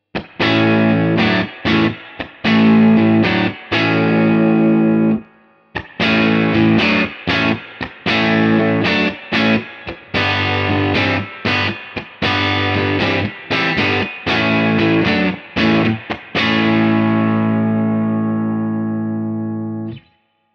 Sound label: Tone Tweaker Rhythm punch; Carl Martin